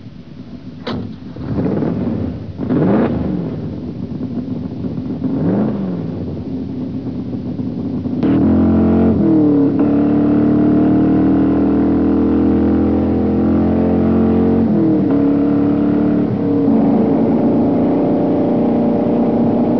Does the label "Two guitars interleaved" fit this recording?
No